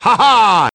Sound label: laugh; ash